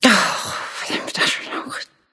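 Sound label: grumble, female; smite; voicepack; hera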